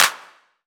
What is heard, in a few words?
CLAP